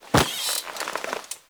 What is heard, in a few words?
Classroom Chair Sit; Ambience; Warframe; TheNewWar; Zariman